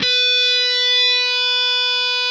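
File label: fuzz, fuzz guitar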